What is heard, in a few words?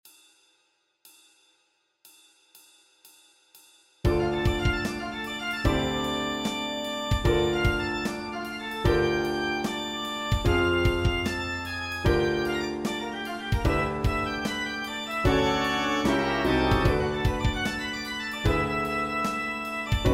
Backing Track, walking bass